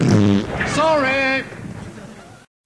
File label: fart sorry